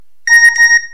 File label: Nice Sms